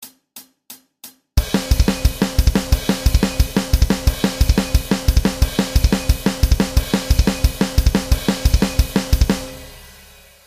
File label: thrash, bass